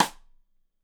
Side Stick Stereo; Deeply Sampled Drums

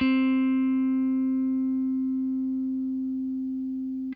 FENDER SFT, Guitar & Bass; Quantum Leap

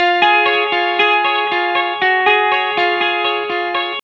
BYRDY RIF 1 CS, Vintage Blues Guitar, 120; USB Soundscan vol.22; AKAI

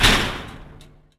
Foley Mid, Drum Bits, Sweeteners

